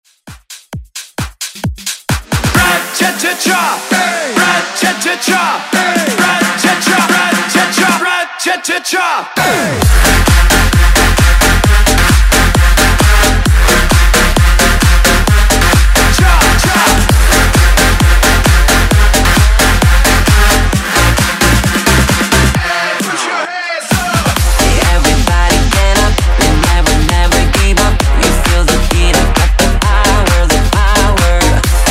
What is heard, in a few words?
Dance Redrum